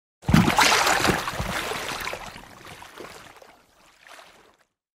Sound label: fall; Projet Web IceBreaker